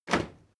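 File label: step crowd march loud single left, footsteps; menschen; schritte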